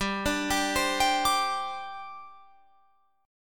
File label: G Suspended 4th G down Guitar Open F; Gsus4 arpeggiated